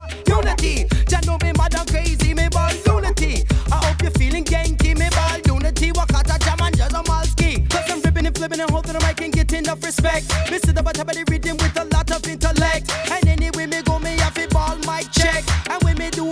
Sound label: western